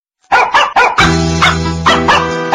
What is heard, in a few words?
Cat Dogs; meloboom